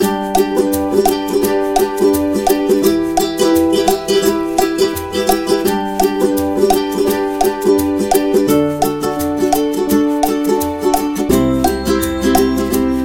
Happy Moments, happy music; happy music vol 2

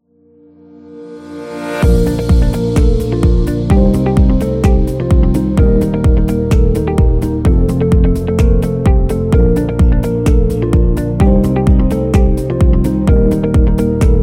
Corporate Happy; technology

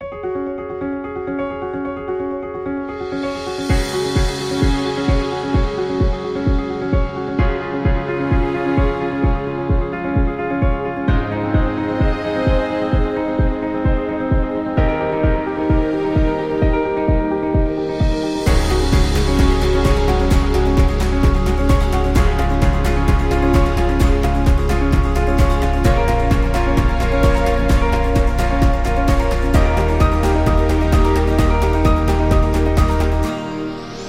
News Epic; motivational speech

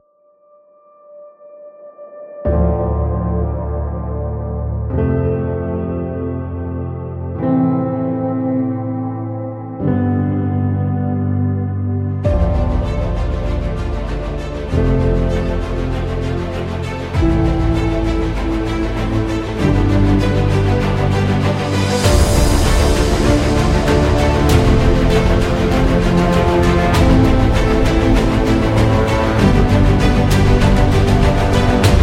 Cinematic Epic

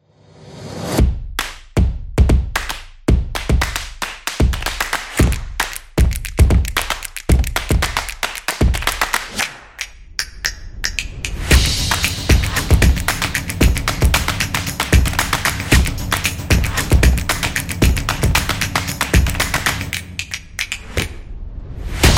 Product Commercial; soccer stadium

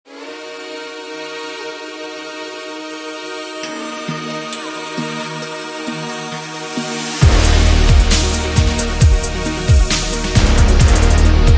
Workout; epic sport metal

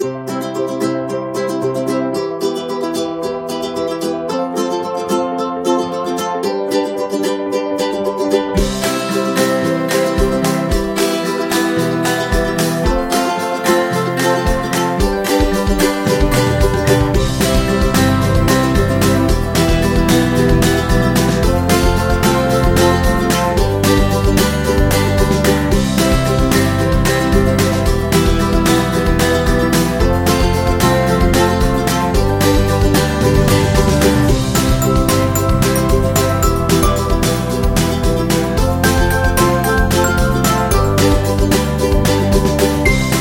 Kids Having Fun, happy music

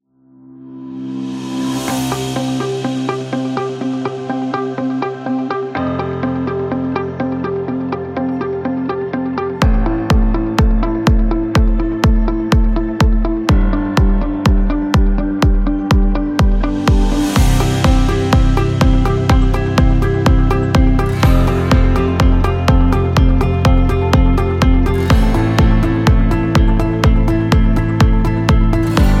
Motivational Upbeat

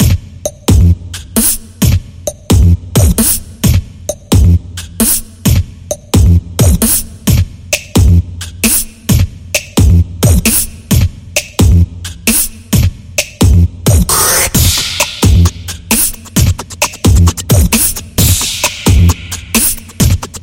A Beatbox; Percussive Nation Vol 10

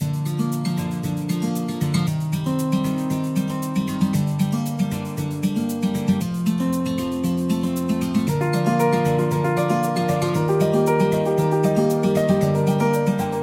Upbeat Acoustic Guitar

